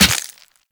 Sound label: Run Bone, Footsteps; Warframe; Operator